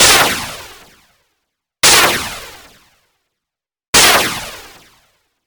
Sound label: Rocket Launcher